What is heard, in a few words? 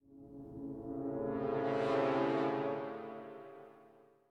Music Shorts